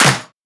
Shifted Clap; FLPRO

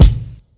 Kick

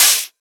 Open Hat; FLPRO

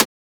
Transient; FLPRO

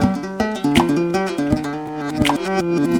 Loop

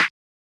Snare

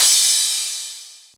Crash

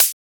Closed Hat

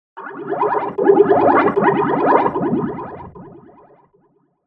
Windows XP Startup Sound in Blubber, Vocoder

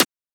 Transient; FLPRO

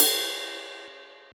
Ride; FLPRO

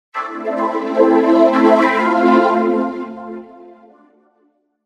Windows XP Startup Sound in Beauty, Vocoder

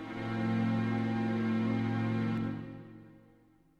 Stringz

